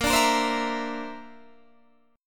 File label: D 7th Suspended 2nd Sharp 5th A sharp